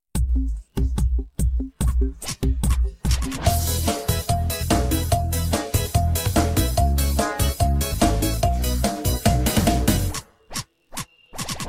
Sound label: Backing Track; Eazi; Pcosmed